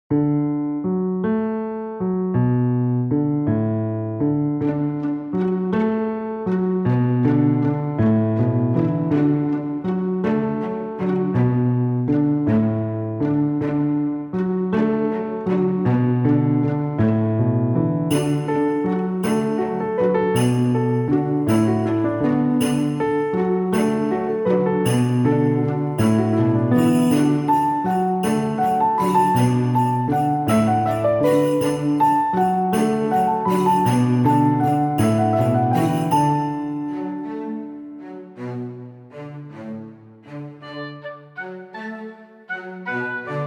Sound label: Wintertime Waltz; Noisebiscuits